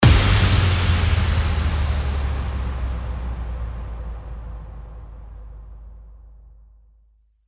IMPACT

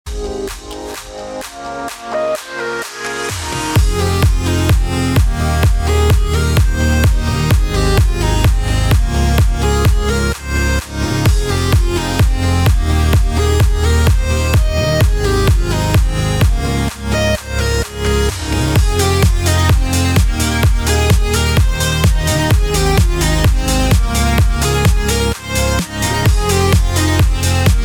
BED EDM HOUSE FMIN 128BPM

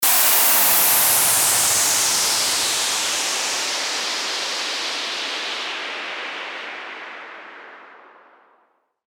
WHOOSH

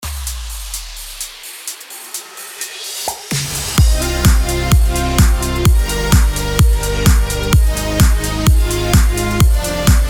BED ELECTRO CMIN 128BPM

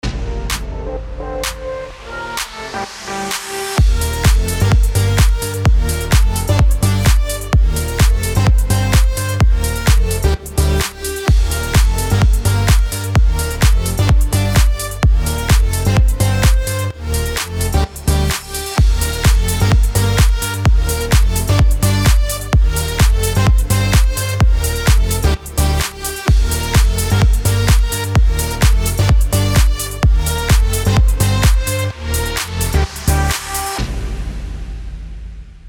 FUTURE HOUSE D#MIN 128BPM

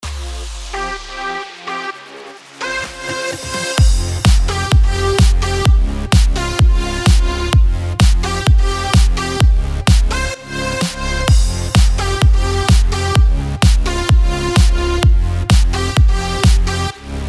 BED EDM FMIN 128BPM

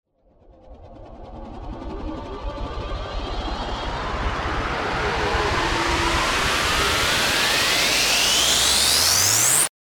RISER

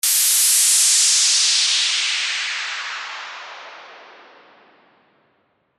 WHOOSH

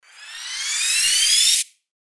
RISER

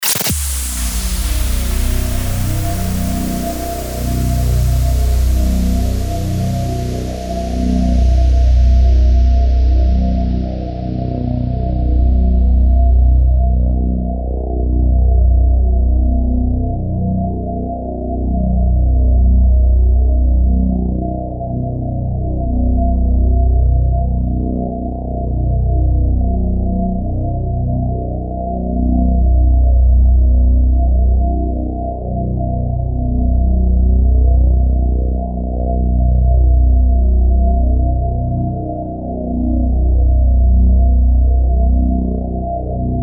DRONE DARK ALLEY 120BPM BMAJ

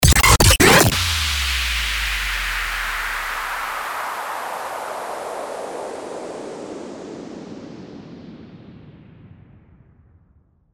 STARTER WHOOSH

